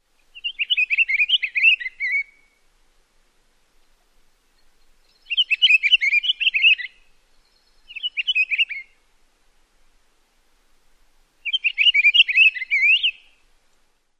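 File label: song, pine grosbeak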